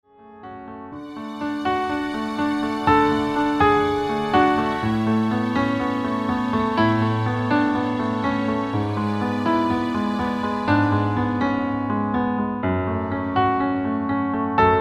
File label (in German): A Strings, Klavierver; Streicher; Instrumental